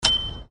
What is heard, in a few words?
game timer start; squid game